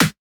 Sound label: Snare, Dance Drum Kit